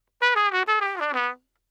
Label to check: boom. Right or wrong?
Wrong